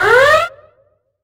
ALARM; XXL 800 Production Effects

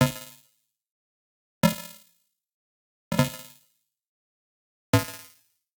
Arcade Click Negative

